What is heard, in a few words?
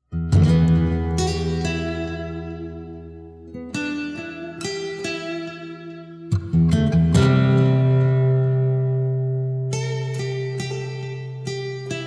guitar